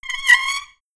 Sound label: Metal Squeak